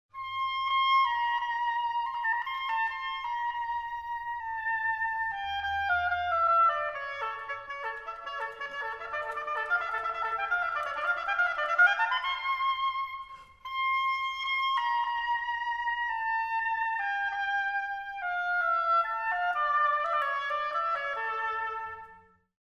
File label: oboe